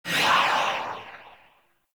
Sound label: Choir Dash